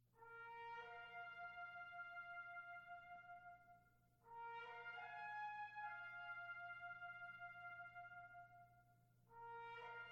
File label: Concerto For Trumpet, Brass Ensemble & Percussion, Adagio, Allegro Moderato